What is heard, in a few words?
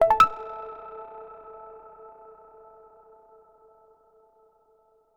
connect